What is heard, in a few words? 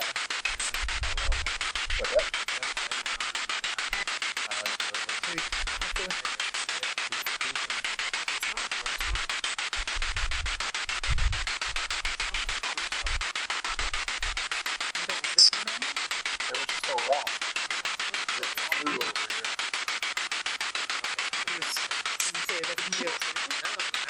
Parking Lot Spirit Box